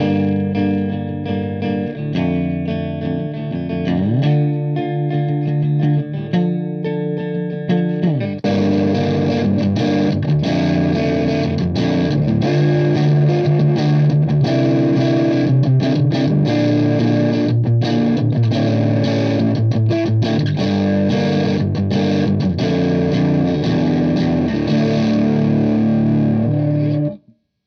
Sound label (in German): Verzerrer Pedale High Gain